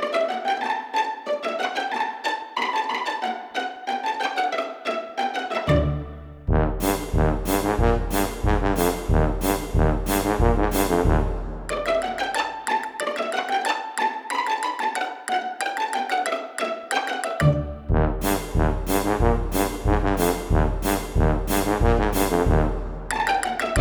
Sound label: Racing Instrumental